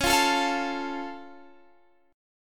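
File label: F Augmented D flat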